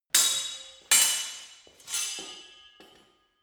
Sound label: sword hit sword sequence